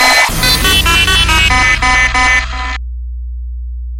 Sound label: sentry jingle